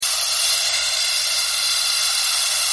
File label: inhale loop; balloons-and-static-electricity